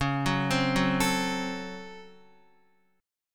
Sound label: Db Major 7th Suspended 4th Sharp 5th, Guitar; 4F,4A,5C,5G,5C,6E; arpeggiated; chord shape; x,4,6,5,6,5